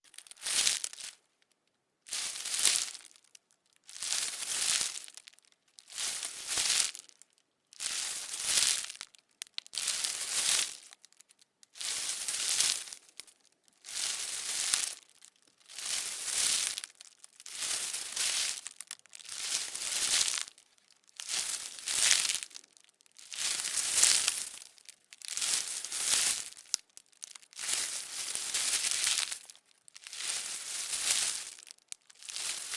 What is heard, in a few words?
the rustle bundle of large dry bamboo leaves shaken